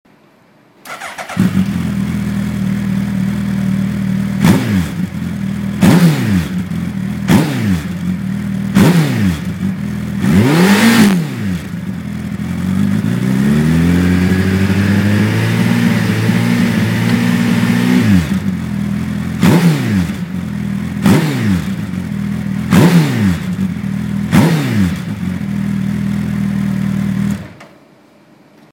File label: gsx1300r